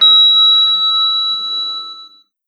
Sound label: Elevator Beem; Angry Goy II; SoundEffects